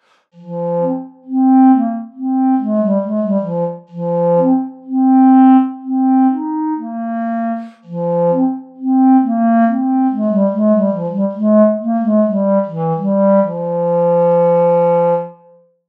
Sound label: Chalimeau